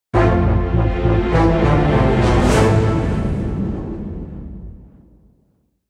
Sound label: orchestral downer